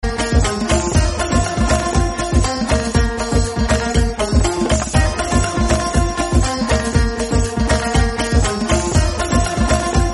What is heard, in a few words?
background music